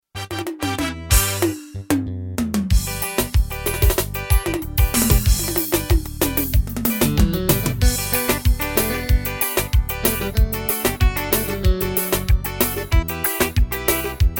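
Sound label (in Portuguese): ritmos korg pa500 forró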